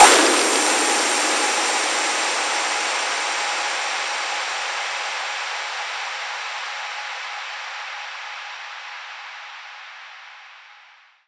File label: Fx Downlifter, 170